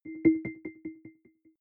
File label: button echo chord